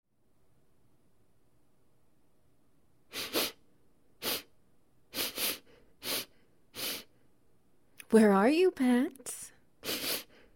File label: Wet Noises